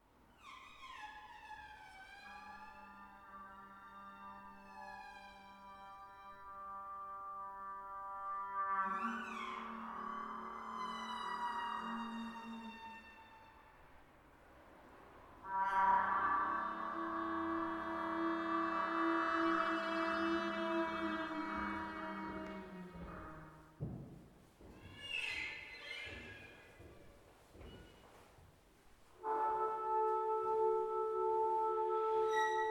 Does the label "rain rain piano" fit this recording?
No